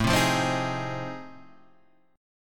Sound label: F 7th Sharp 9th